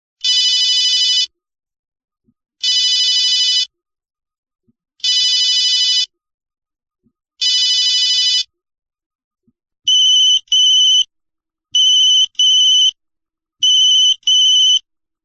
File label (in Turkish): eski ericsson melodileri